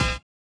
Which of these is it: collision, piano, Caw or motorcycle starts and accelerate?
collision